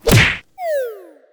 die; Flappy-Bird GX2

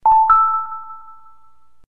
menu in; usbloadergx

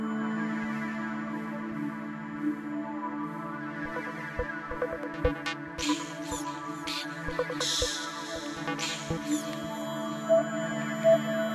bg music; snes9xgx

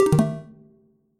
Fail; ModMii v7.0.0; XFlak

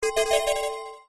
shutdown; R4-Advance Kernel 1.22; moonshl

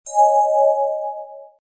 startup; MOONSHL

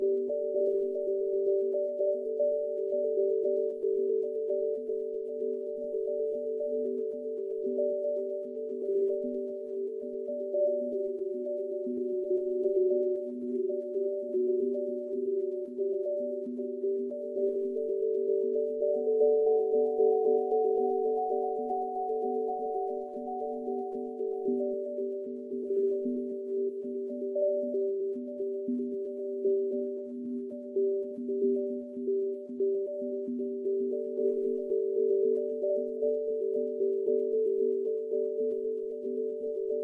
bg music; usbloadergx

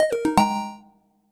Success; ModMii v7.0.0; XFlak

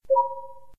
button click; usbloadergx

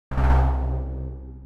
Fail; modmii

